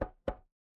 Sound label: impact wood knock